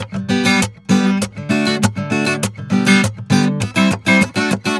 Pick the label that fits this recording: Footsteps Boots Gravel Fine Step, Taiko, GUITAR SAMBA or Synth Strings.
GUITAR SAMBA